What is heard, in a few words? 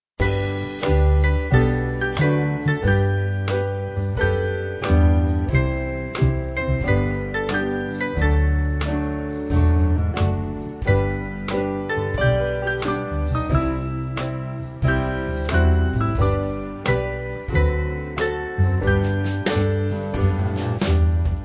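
Band, Music Backing Files for Churches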